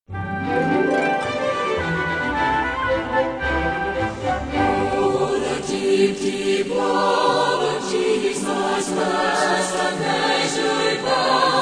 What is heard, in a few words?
Choir And Orchestra